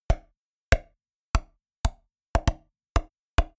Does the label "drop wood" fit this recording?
Yes